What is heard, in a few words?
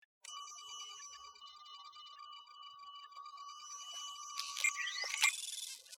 engineering device; TNG